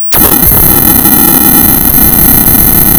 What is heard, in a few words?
pop, tearing paper